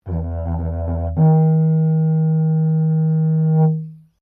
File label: Didgeridoo Traditional Tapered Motif, Traditional Australian Aborigine didgeridoo; Traditional Australian Aborigine didgeridoo designs; Miscellaneous Musical